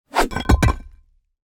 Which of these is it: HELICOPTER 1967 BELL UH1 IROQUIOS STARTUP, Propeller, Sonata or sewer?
sewer